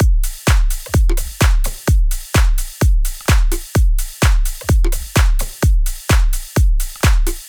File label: Shuffle Full Drum Loop - 128 BPM; Drums; Loops; Cymatics; EDM Starter Pack